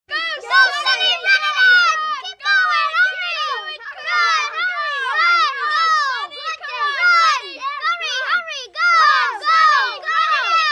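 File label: Crowd Kids Play Football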